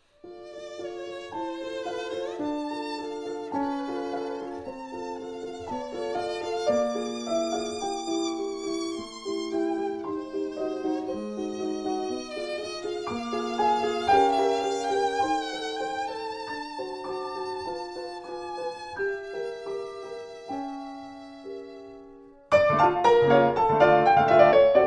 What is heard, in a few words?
Reger Sonate